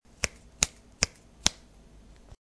click test

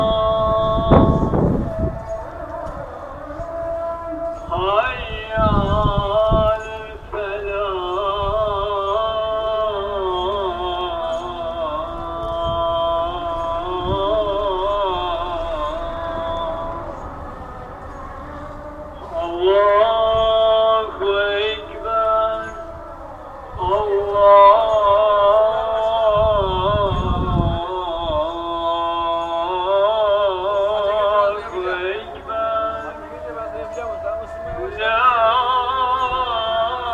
call to prayer in Istanbul

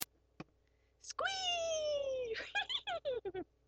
squee sound

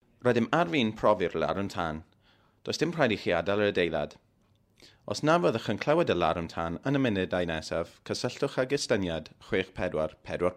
fire alarm, larwm dan; ty hywel 2016

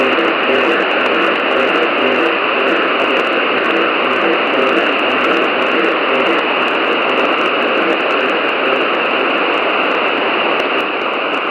radio marti hf cuban jammer

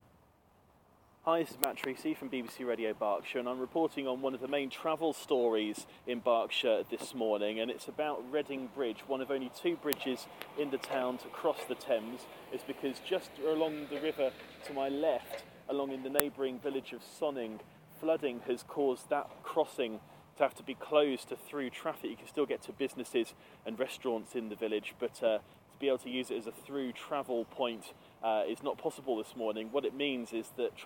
heavy traffic on reading bridge

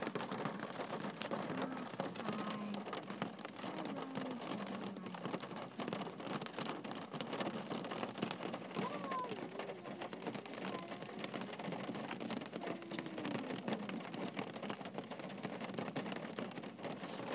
rainy rain

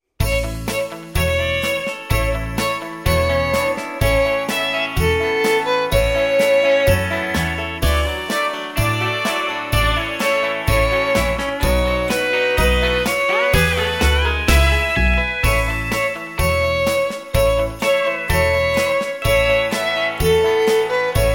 jingle bells

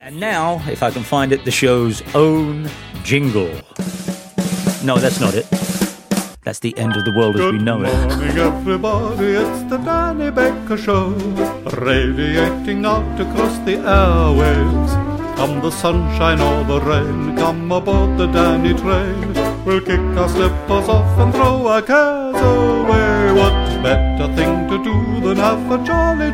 show jingle

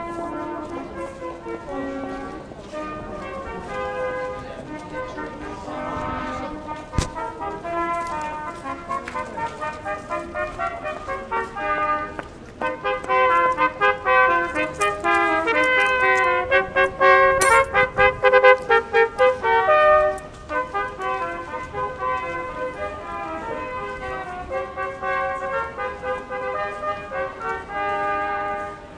christmas trumpeters on market street manchester

